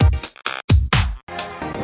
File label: serious mood, synth and electronic loops